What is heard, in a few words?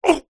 human fight karate vocalisation male